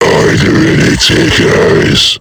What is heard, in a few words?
alert; kfsmr flesh clown